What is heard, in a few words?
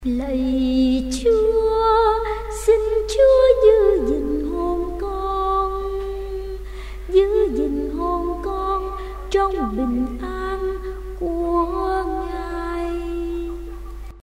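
soprano tenor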